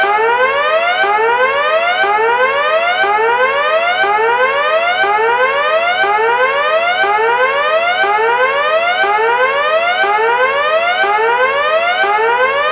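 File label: Facility Alarm Short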